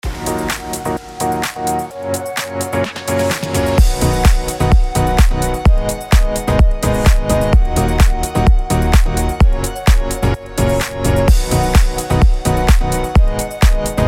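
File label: HOUSE B major 128BPM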